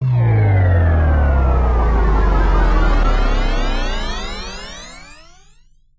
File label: levelup long